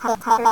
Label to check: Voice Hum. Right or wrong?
Right